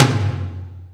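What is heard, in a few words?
Lo Cannon HL, Alesis D4 drum machine tom; 300 Drum Machines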